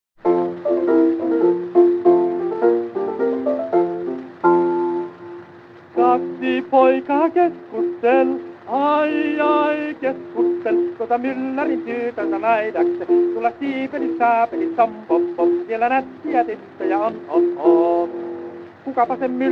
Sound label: Gramophone